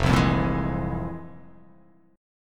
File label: F-Minor Major 11th, down; FmM11 strummed; JGuitar